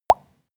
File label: pop bubbles; soundpool